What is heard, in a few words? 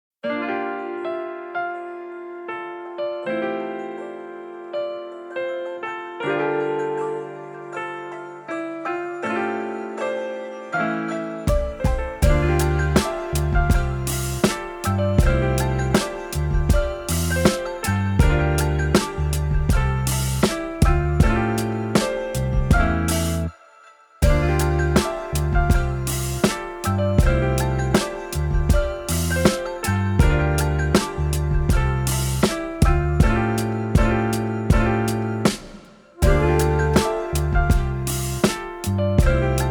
piano lofi; reach; sinuslabs